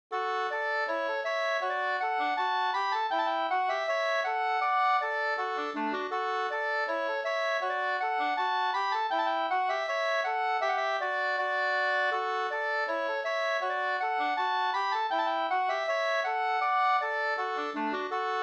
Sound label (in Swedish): brudmarsch; Jonkens brudmarsch Klaxasen Jm